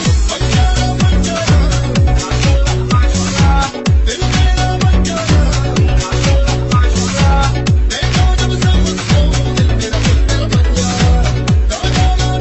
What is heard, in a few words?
tone